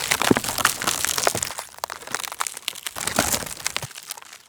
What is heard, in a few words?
wood cracks